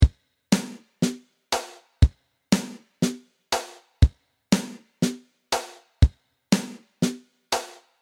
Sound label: Backbeat Room, midi